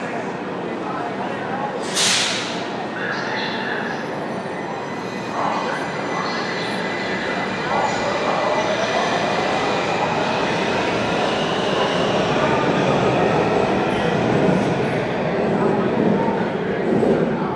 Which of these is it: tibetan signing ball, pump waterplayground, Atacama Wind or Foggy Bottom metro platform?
Foggy Bottom metro platform